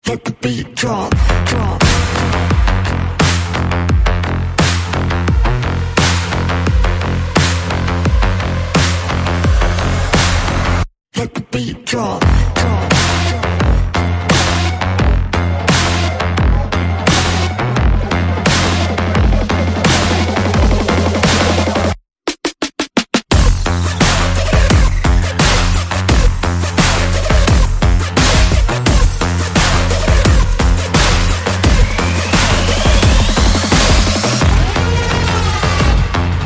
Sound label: light percussion